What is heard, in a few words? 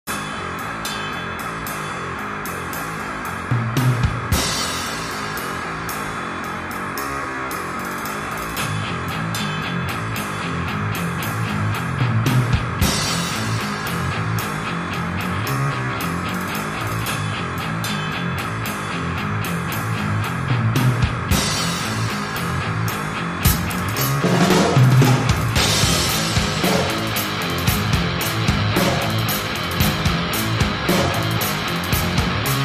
Slow